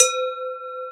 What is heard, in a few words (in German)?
Almglocken; Planet Earth; Digital Sound Factory Planet Earth